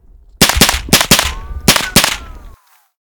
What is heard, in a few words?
22 Caliber Gunfire With Ricochet